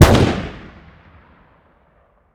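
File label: weapon ar fire player atmo exterior; gmod; viper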